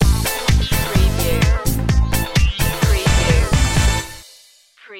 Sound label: funkee slide